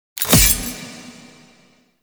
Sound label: Fishing Perfect Hit; Weapons; Venus Fishing Spears; Warframe